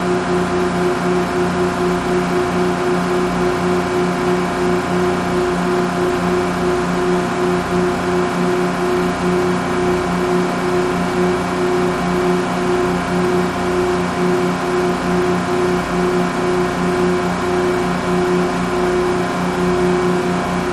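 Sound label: Diesel Engine Constant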